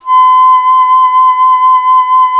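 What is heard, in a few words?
flute, alto flute; Miroslav Vitous; Solo Instruments VOL-2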